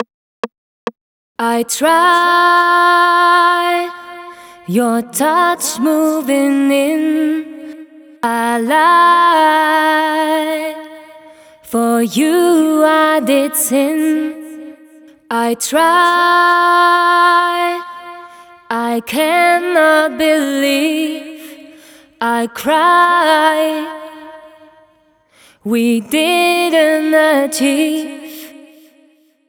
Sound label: Chorus Variation FX, 138 BPM; Vengeance Samples